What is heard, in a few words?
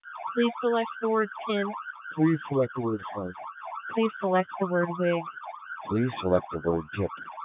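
P25 full rate siren; Codec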